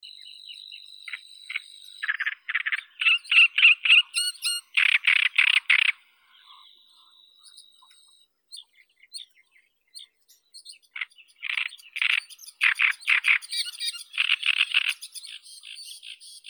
kamishovka drozdovidnaya acrocephalus arundinaceus

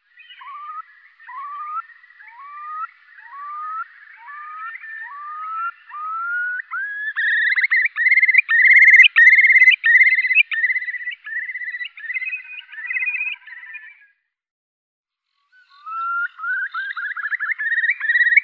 kronshnep bolshoi numenius arquata

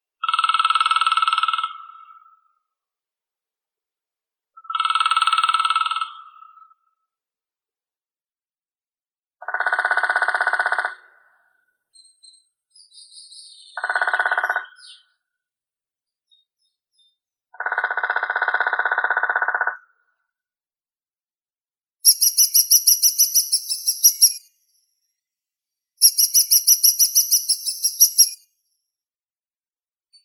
dyatel pestrii malii dendrocopos minor

